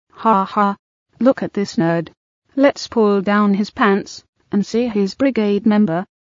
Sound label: laughs; pulls down your pants